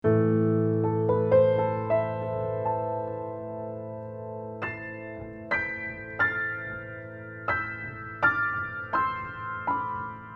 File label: Piano, UM17; SHAFER GRAND PIANO; AMI UM17